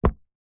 foley footstep single trainer wood floor gentle soft